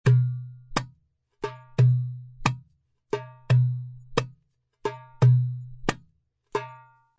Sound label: turk aksagi slap